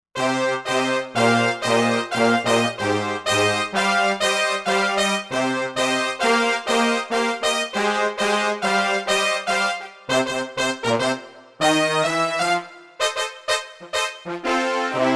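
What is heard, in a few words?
Brass Section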